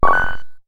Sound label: Coin